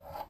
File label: move; jocly; checkers